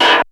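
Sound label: METAL, FX percussion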